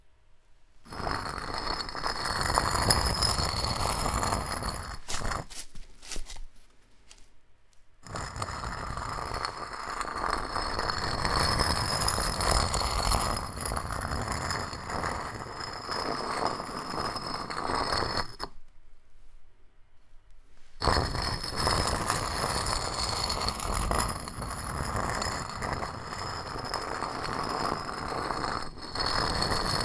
Stone Dragged On Concrete Floor

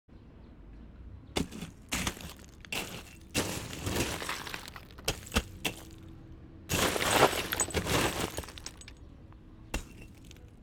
Digging In Rubble

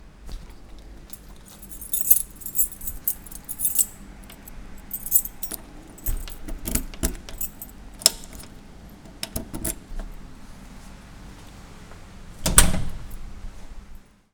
Closing A Door With Key

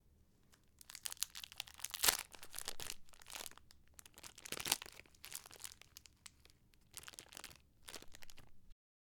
Plastic Packet Opening

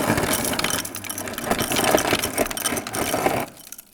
Ice machine

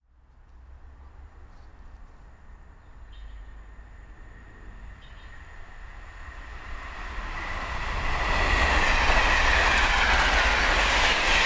Train Pass

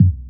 Kick Hiphop

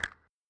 Click Basic

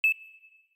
Web Buttons

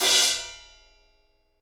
Crash Z Custom Projection 19 Choke

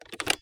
Door Handle

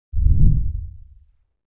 Woosh Low

